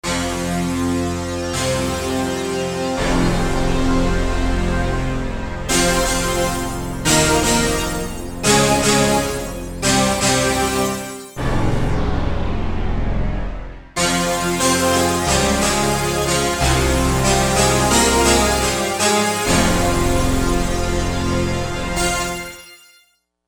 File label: Fuzzy Stacks